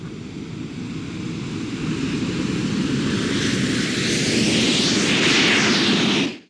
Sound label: DROPSHIP, ambience